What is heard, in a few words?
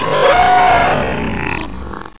die, baby garg; svencoop